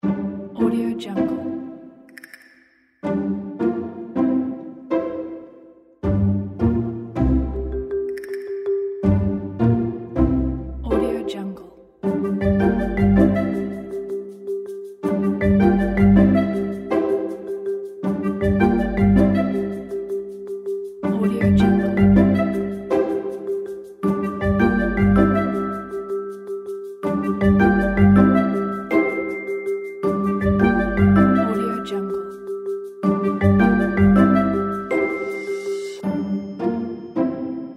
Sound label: hollywood comedy